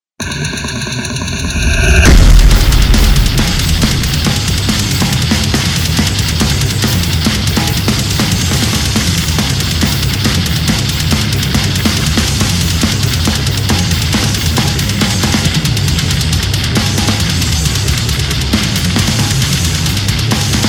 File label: Intro Instrumental